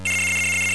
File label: coin; GBA; MLSS